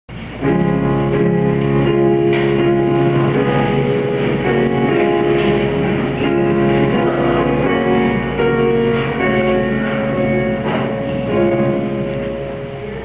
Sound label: Hymn